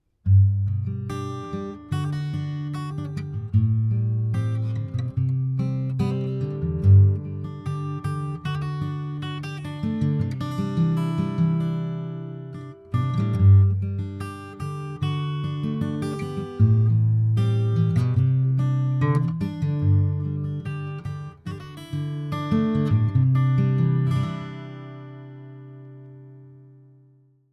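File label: guitar soft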